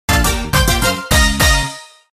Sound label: Mission Start Fanfare; mario kart tour original soundtrack